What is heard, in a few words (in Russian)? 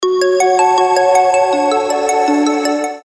rington; redkaja melodija